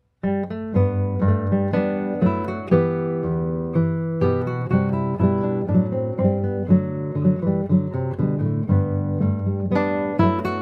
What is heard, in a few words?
Gavotte, guitar; Handle